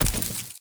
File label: Walk Vegetation, Footsteps; Characters; Enemy; Lady Deora